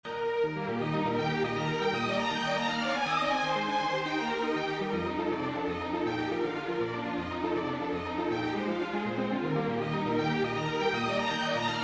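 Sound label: prelude major for string quartet; chopin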